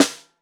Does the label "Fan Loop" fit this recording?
No